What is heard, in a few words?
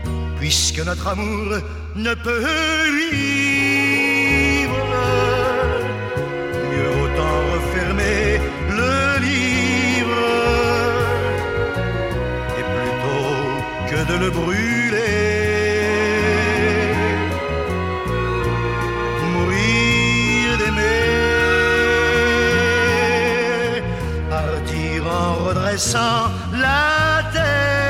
ringtone clasic